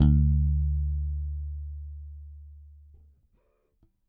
Whole Note One Shot D, Bass; Bass X

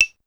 Claves, Pitched Up, Clavia DDrum; Clavia DDrum-AT

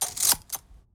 Staple; neuro; InsideInfo; Real World Sounds

